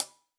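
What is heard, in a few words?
Sab Closed Hat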